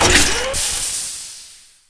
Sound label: Gun Ammo Out Scifi, Weapons Foley; SFX Kit